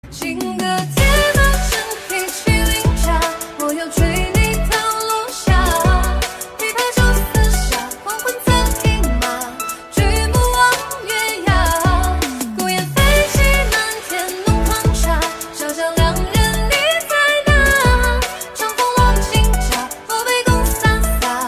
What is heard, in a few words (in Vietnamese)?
nhac chuong remix